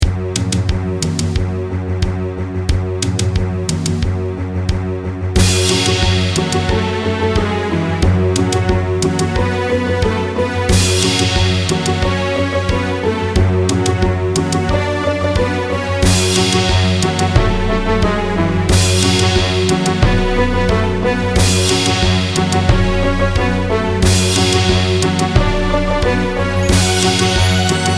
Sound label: epic battle